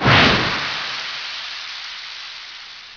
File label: flare